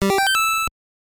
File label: Get Item - Heart; nuts milk; nes